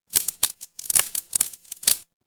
fire electric crackle

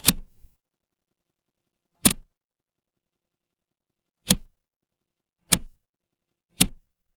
mechanical switch

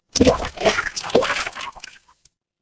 water splash

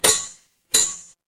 impact anvil

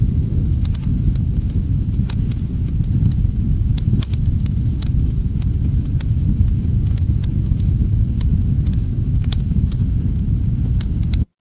ambience fireplace

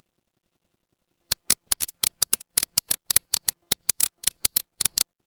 office pen

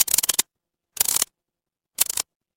mechanical gear

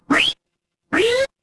retro game jump

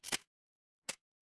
office camera

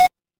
retro game coin

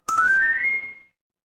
feedback success

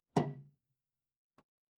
glass ceramic

